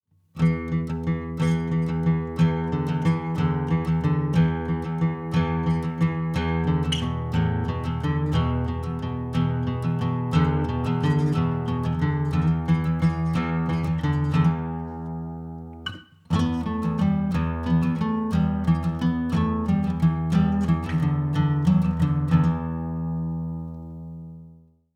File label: Subdividing; West African rhythms